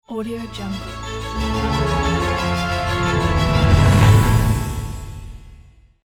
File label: cinematic hollywood logo